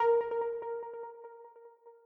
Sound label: EPiano